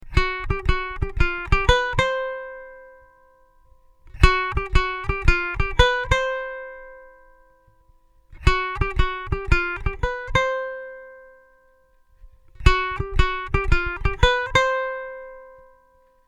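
vamp picking, Hawaiian Vamp Chords & Picking on Ukulele; Hawaiian song; signature riff connecting two parts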